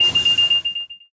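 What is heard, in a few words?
war ui notification alert shields gone bells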